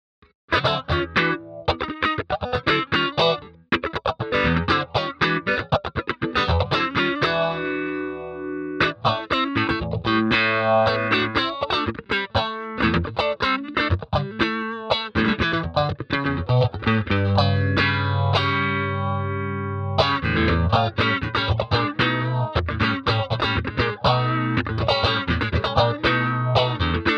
Tele Clean Phaser

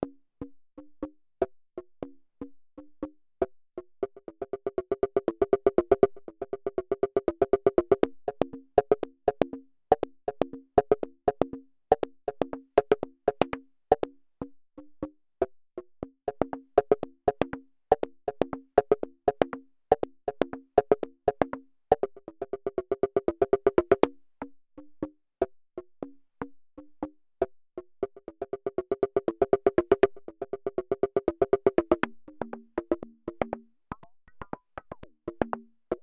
hand drums Mellotron

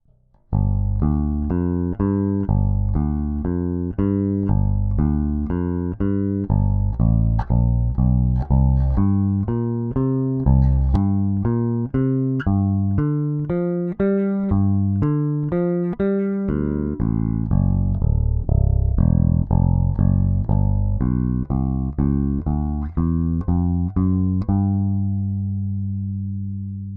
AER AMP One Two Three High Mid boost

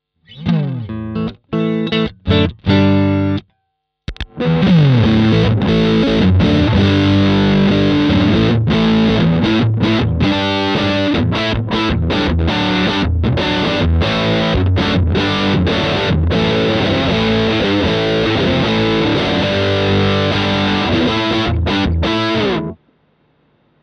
Gain max Chords; BM20 ULTRA

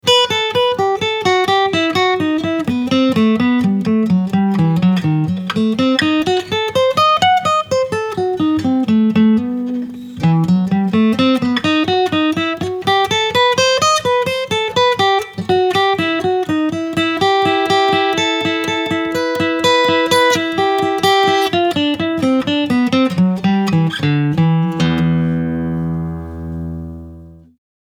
Single Picking Mic

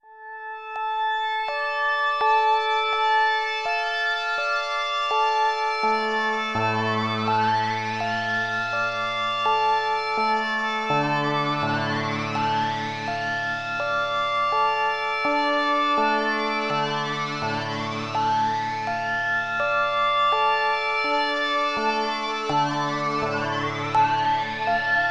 Polysix Poly

